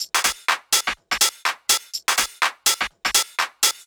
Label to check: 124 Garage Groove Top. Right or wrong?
Right